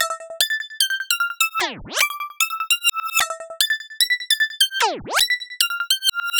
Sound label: Lead Loop E Bell glitch, 150bpm; Dubstep; Ghosthack; Dubstep Sound Arsenal